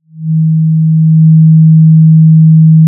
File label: Sound of a cruise ship horn